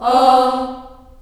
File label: C3 CHS AH -R, CHILD AHS, Choir; USB Soundscan vol.28